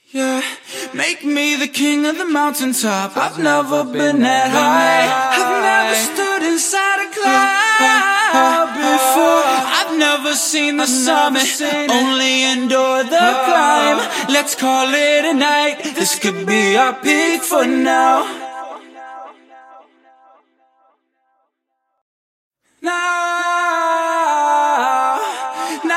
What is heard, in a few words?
90BPM Key Am, Acapellas